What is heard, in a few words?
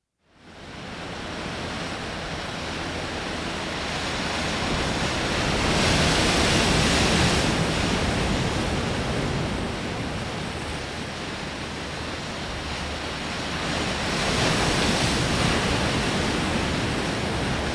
Beach